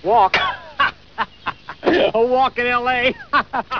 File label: walk